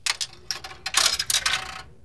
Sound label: payphone call